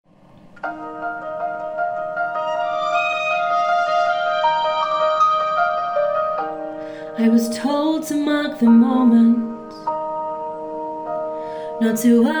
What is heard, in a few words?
Vocal; Auditions